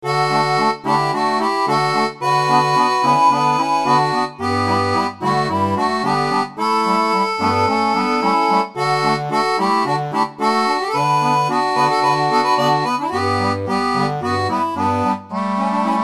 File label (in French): seraphone, orgue barbarie